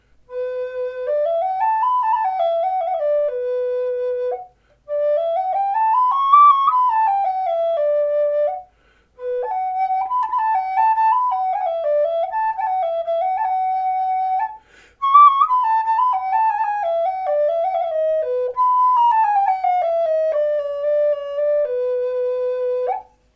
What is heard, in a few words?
B4 Shadow Hawk, flutes; stained seam sealed